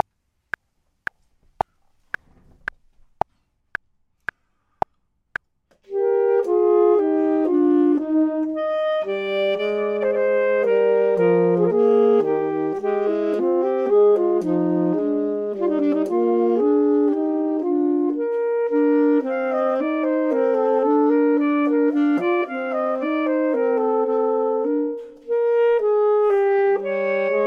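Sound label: both, Saxophone and Clarinet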